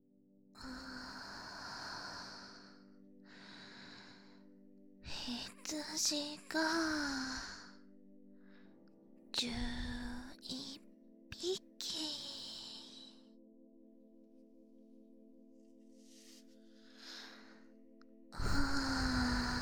バイノーラル; 13人の声優がひつじを数えるだけの音声【ループして聞ける安眠音声】

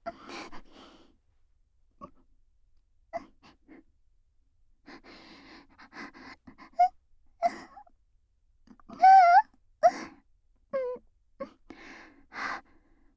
密着吐息＆囁き喘ぎループトラック, ASMR; ルカちゃん

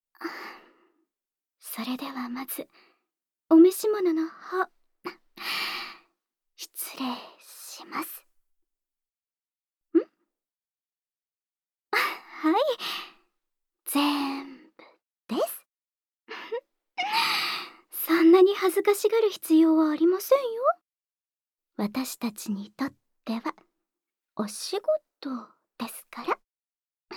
【環境音無し】 フェイスマッサージ; メンズエステ; 高音質バイノーラルKU100

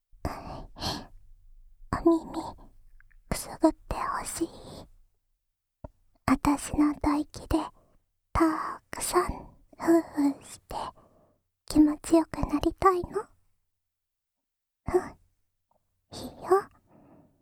安眠用お耳ふーふーループ（左耳）, ASMR; 禁断の魔女

